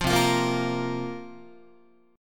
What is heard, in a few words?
C 7th Suspended 4th, Guitar, chord